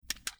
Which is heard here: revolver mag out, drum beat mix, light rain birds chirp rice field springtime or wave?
revolver mag out